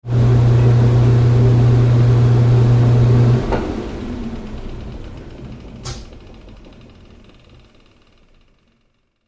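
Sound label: Washing Machine Rinse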